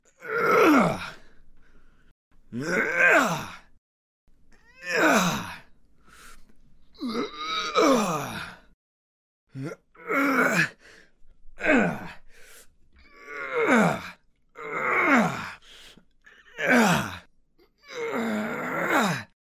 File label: Efforts